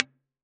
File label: Accoustic2 Impulse